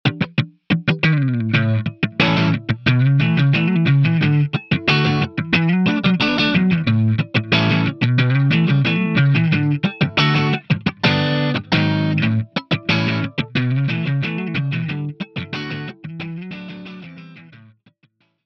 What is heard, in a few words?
Ghost Notes